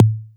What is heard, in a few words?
Dirty Dutch Percussive; Mutekki Media; Vengeance Electroshock Vol.2; Dirty Dutch Special; Dirty Dutch Percussion